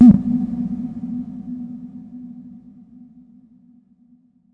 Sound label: SHORT, SHORTNOIS